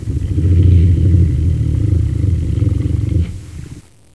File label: WILDCAT, ANIMAL Soundclips